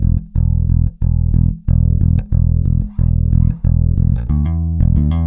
Rock, Bass; Bass X

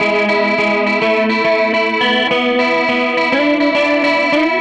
Gretsch Guitar Loop

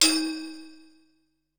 Rack Bell, Drum Machines Ensoniq SQ-R-Plus; 300 Drum Machines

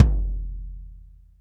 BD, Bassdrums, Roland TD-20

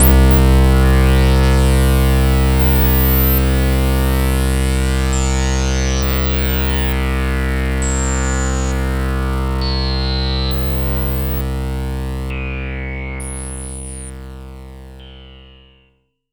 pad C2, 5U Modular; Polyend; Simulacrum Sounds; 1 Shots; Synths; Pads

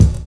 VINYL BD, Drums Dance & Groove; USB Soundscan vol.11; AKAI; VINYLKIT

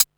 HAT, Techno 138 BPM; Best Service ProSamples vol.54; AKAI

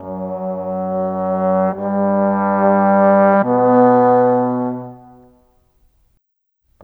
Cinematic Trombones; Sonic Foundry (Sony Creative Software); Orchestral Series VOL-3 - Cinematic

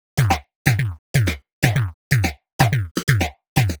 124 Perc, Drum Loops; 124bpm; Nu Dance Electro

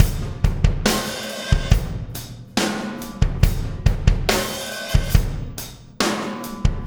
Impact Crater Beat, Processed Drumkits

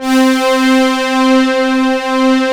ELG STR C4, ELE STR

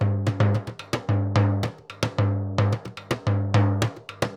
Surdo Murga 110 bpm; Best Service; Festa Latina VOL-1

